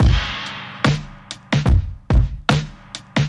returning crash, drum loops, boombap

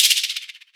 floppy, shaker

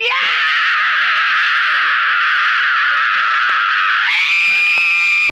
scream long

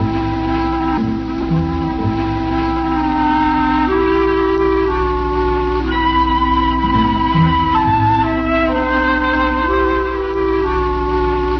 horns suspense, jazz loops

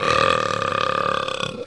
burp long

